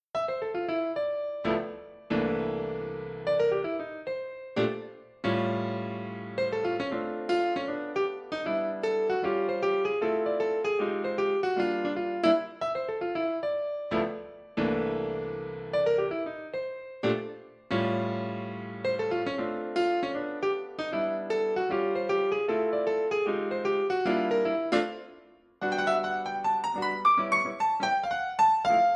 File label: Party Of Three Piano Solo